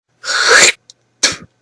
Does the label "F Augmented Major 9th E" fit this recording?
No